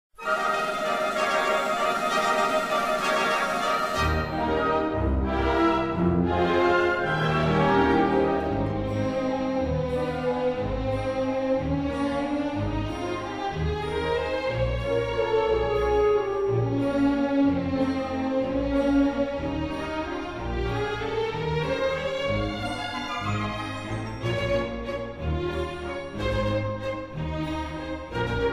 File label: Bridal Waltz